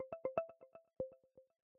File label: Loop Scanning Magnifier; Apple; iOS-26; MagnifierSupport